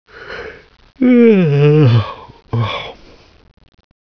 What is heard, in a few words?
yawn, scientist; svencoop